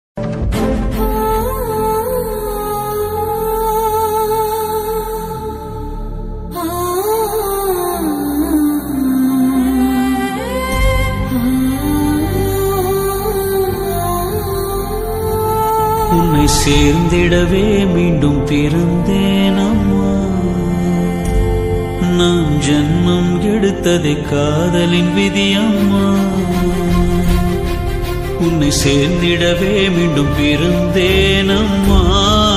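heart touching bgm ringtone, south indian bgm ringtone; maaveeran; magadheera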